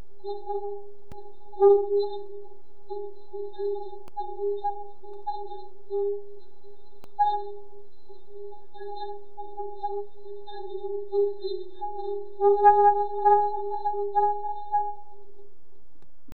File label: spin round